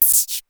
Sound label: KLONE NOIZ, Klone Dual-Percussion-Synthesiser; KLONE NOIZ NW8